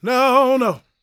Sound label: GOSP MALE, Vocal Gospel; Vocal Planet Vol1 Gospel